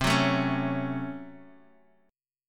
C sharp Major 7th Suspended 4th Sharp 5th

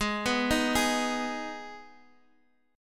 Minor, Guitar, Standard; Gsharp; G#m; arpeggiated; x,11,9,8,9,x; down; capo=3

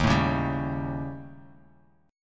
D sharp 7th Flat 5th, Guitar, Open F; strummed; D#7b5; JGuitar

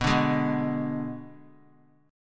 B Minor 6th B; Bm6 strummed; 23,21,23,21; JGuitar

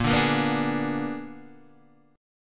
A sharp 7th Flat 9th, Guitar Open D chord

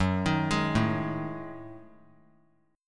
Gb Minor 6th Add 9th Gb down; arpeggiated; JGuitar

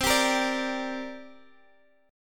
D 7th Suspended 4th Sharp 5th C

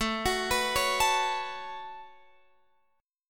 A 6th Add 9th, Guitar, Open F; A6add9 arpeggiated; x,16,18,18,16,16

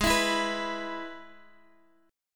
Ab Minor Sharp 5th, Guitar, DADGAD; down

